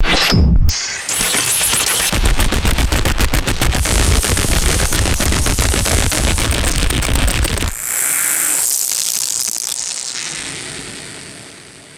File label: rapid synthetic electrical static snaps